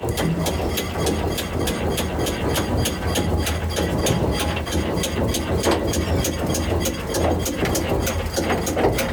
turret, tanks; World of Tanks

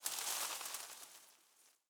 leaf rustle twiggy hard

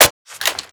reload hit, foley; sa80

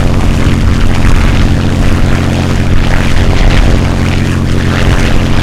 mustang rear, engines; War Thunder

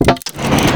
armada latch pull

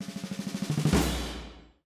music interactive; World of Tanks

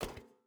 footstep barefoot metal walk; Rust

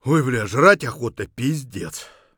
tired, voice; Escape from Tarkov

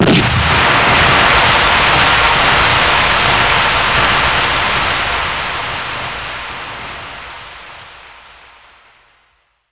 smoke grenade; weapons; Modern Warfare

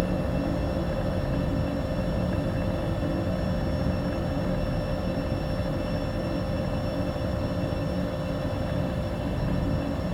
ship engines; World Of Warships

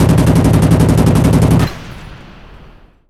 plane; World of Tanks

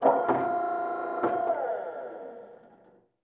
ac130 hydraulics

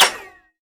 impact helmet ric; Escape from Tarkov

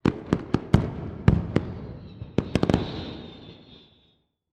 hangar; War Thunder

